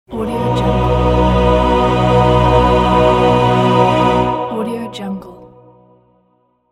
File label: orchestral intro short